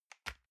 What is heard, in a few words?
envelope drop soft